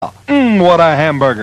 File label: "Mmm"